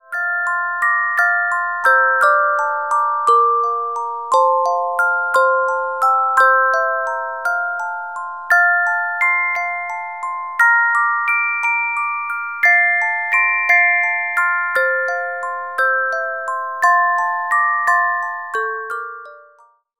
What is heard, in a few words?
Carillon Music Box Melodies